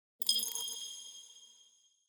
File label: UI comform sound